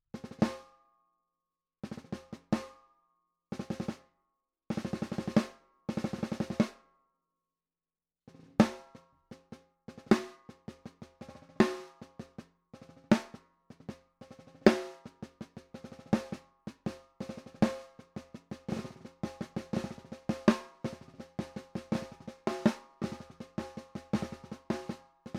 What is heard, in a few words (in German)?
Snare Hohe Stimmung; DW Designer